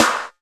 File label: HI POP SNA, Drum Mashines, SR-16 DRUMS; EdgeSounds